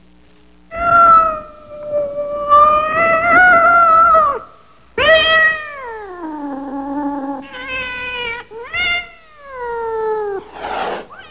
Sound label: Cat meowing in fear